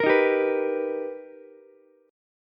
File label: B Augmented Major 7th B Ukulele, chord; B+M7; strummed; 15,15,15,14; JGuitar